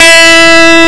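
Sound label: 60s Rock Guitar, Medium overdrive guitar E4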